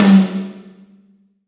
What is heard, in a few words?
ambient tom